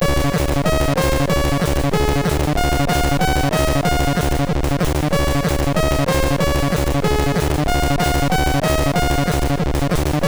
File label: Techno Koleda, MOS6581R3